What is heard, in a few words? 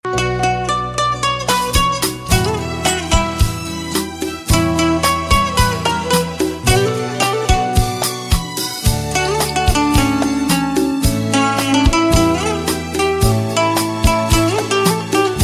Instrument